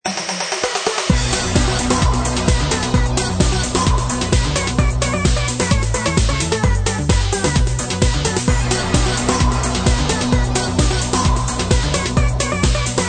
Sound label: dance techno fish